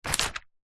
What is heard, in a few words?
notepad page turn thin paper a4; zapsplat